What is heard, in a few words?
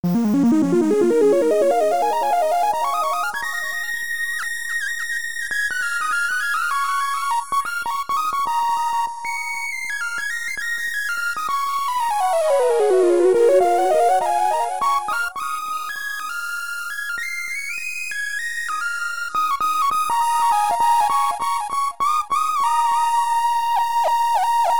Super Saw Lead